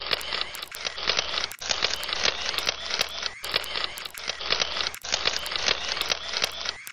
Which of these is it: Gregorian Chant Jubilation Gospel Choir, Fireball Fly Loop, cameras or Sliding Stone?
cameras